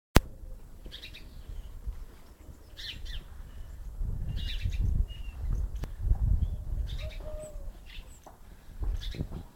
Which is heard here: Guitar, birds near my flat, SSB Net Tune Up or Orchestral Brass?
birds near my flat